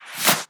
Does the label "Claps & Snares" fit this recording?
Yes